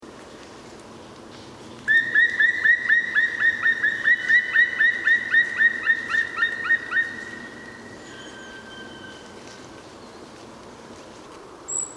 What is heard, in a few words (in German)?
Schwarzspecht; Oberlfing; Michle Pron